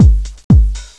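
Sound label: drum loop; Descent II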